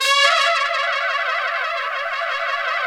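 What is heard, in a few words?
brass, Tpts FX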